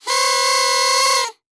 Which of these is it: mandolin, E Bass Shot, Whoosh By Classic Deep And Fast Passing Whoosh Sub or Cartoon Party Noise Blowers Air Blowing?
Cartoon Party Noise Blowers Air Blowing